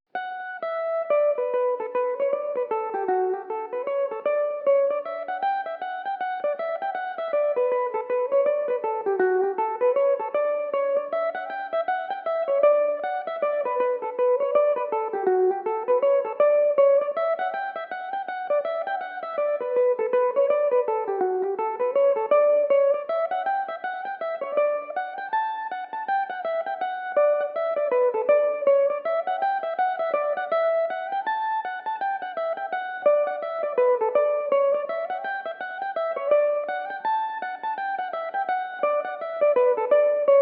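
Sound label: D major belmuse, irish mandolin; The Pouca; Reel (4/4); Belmuse EM-190 electric mandolin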